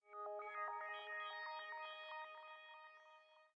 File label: Calm; super mario maker 2; Peaceful SFX Raws - Otoasobi Calm SE